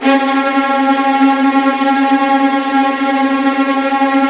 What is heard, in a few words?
VIOLIN D#4, Synclavier Strings; ILIO